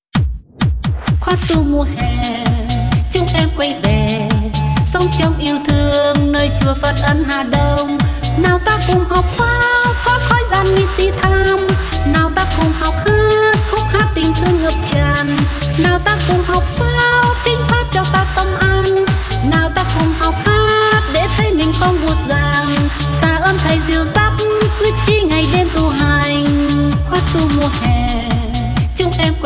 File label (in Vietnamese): Nhạc Phật Giáo 432hz